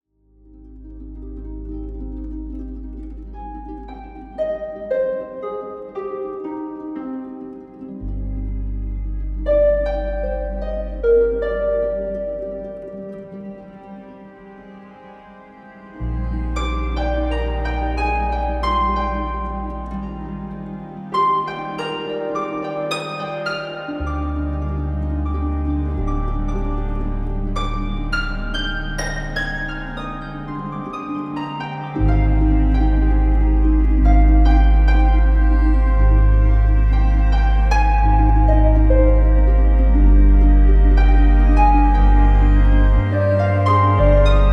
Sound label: Harp; Sonic Atoms; Navia